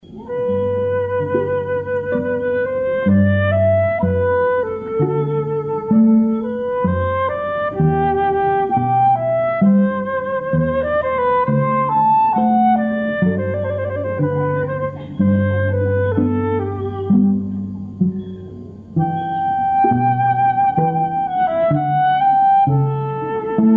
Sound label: String Quartet